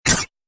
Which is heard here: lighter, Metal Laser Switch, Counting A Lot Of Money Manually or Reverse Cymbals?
Metal Laser Switch